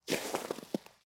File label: Snow Walking Single Step; SoundsCrate